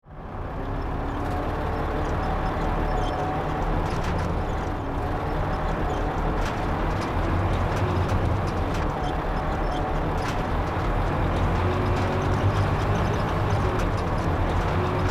Truck engine moving truck with quirky noise loopable